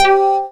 ping; Sonic Foundry (Sony Creative Software); Synchro Funk; One Shot Sonics

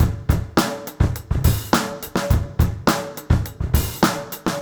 Crunchy 104, Beat Loops; Zero-G; Monster Beats

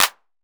perc classic clap; percussion

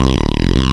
roller; PlatinumPhattCD1; E-MU Producer Series Vol. 8 – Platinum Phatt; Phatt Combo

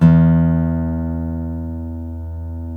folk steel, guitars

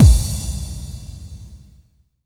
BD-FX, Dance Mega Drums; Best Service

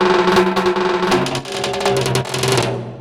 Weird Timbal Fill; Sonic Foundry (Sony Creative Software); Futurist Drum & Bass

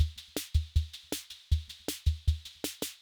Loop, rhythm king; classic machines

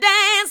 ADLIBS 90; Ueberschall; Jam Box

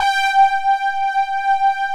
SYNTH SOFTS; Pro-Rec - Synthsonic

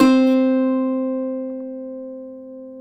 cuatro; Spectrasonics; Hans Zimmer Guitars VOL-2

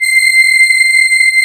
CMI ORGAN

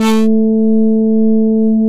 FM SYTH, TURBOTRONIX; NorthStar - The Wizard VOL-2

